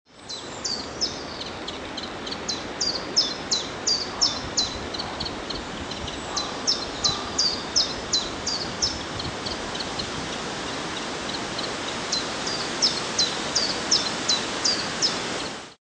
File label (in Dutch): Tjiftjaf, vogelgeluiden